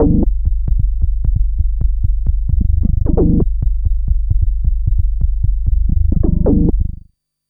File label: NOISE FX; drums pads; noises